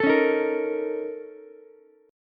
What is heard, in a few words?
Bb Minor Major 7th Double Flat 5th, Ukulele; strummed; chord shape